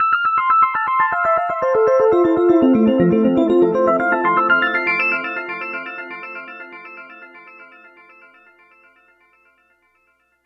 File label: Echo Rhodes Lick; Keys; Dr. Fink's Funk Factory